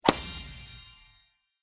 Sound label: Dart Hit; DailyChallengesPopup